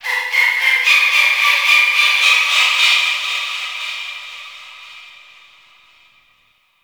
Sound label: Forest Distant Bird Of Prey, Ambience; Warframe; Grineer